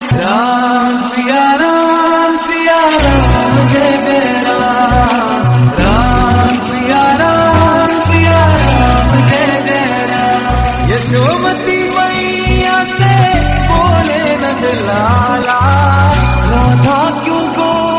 bhakti hindi

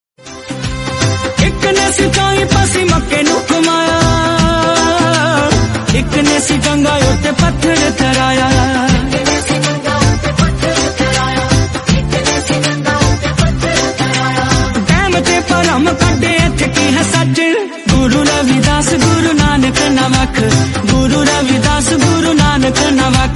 devotional punjabi

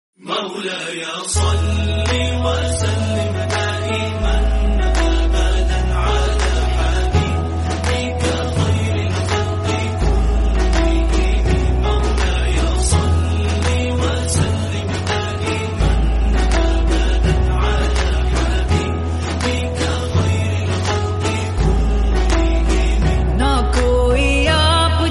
naat

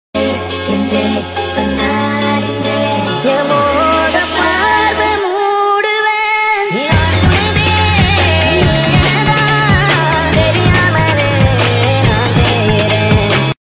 remix song

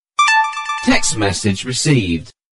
woo hoo text message notification

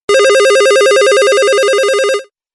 old old old phone

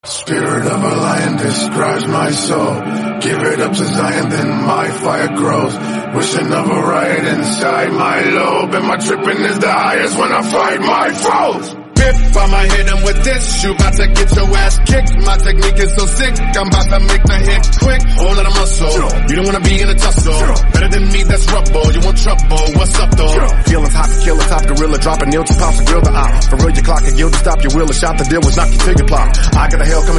remix english x hindi song

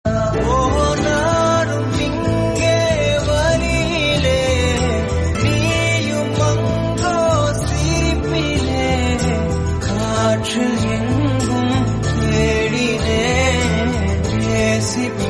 tamil song; david

